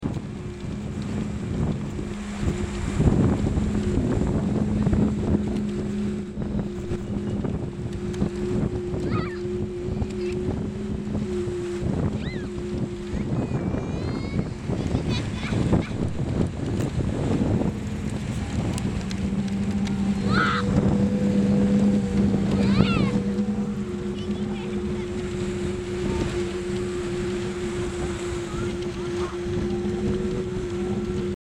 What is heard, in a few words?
gourds